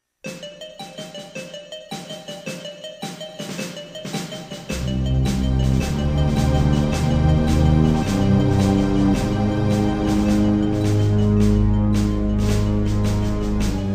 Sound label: Intro Music; Doom 64 Soundtrack